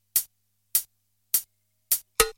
koubel melodiya tarelki

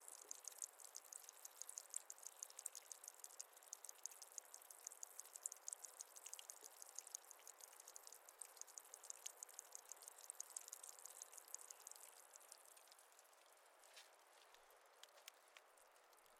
legkaya strujka vodi shum vetra ustranen

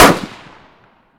pristrelka korotkostvolnogo oruzhiya

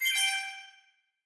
korotkij spokojnij priyatnij zvuk uvedomleniya

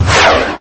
zvuk letyashego snaryada

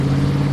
korotkij shumnij zvuk motora

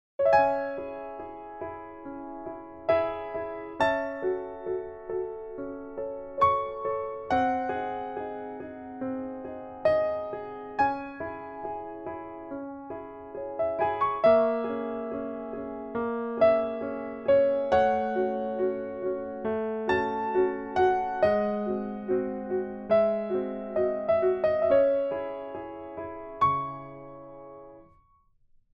tihaya i legkaya fortepiannaya muzika

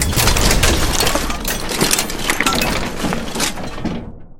pogrom na sklade boevogo oruzhiya

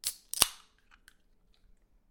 pop korn otkritie koli

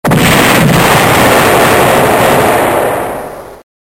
vzorvalos chto to bolshoe

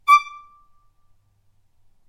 zvuk noti re tretya oktava

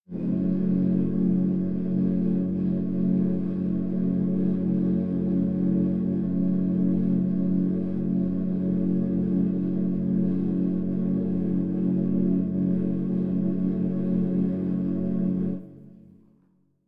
gudenie fa minor

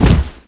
gromkij korotkij chyotkij vistrel salyuta

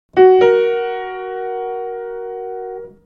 pianino melodiya nezhnaya

